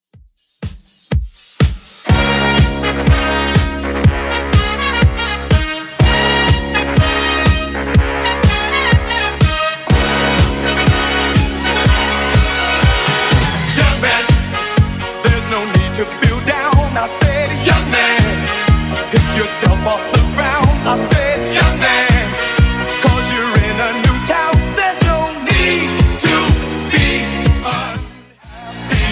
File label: 70s 123bpm; Xtend Edit